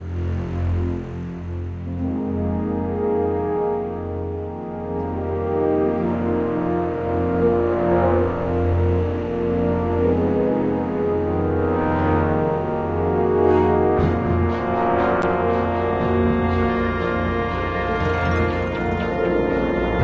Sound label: Ambient Theme